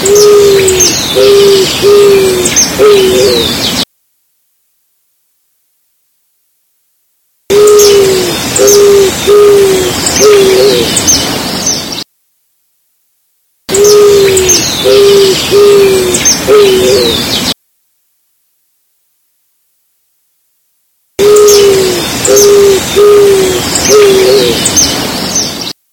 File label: Zenaida auriculata - Torcaza